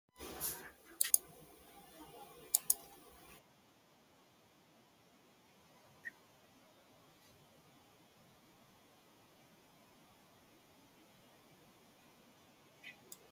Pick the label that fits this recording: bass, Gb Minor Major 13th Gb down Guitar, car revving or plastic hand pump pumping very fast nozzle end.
car revving